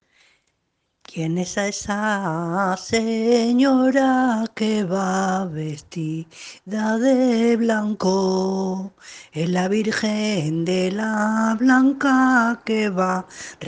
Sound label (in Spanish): Cantar